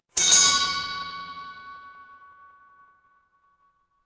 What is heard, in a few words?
instrument bell